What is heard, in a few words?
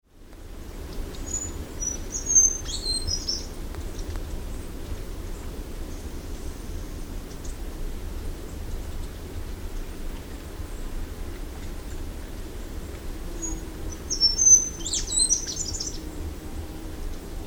Scaled flowerpiercer; پرنده گل شکاف پولکدار